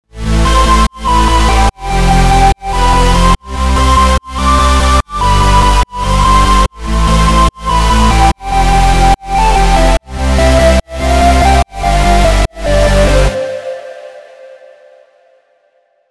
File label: Melo Chord Bass